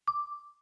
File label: chimes (D)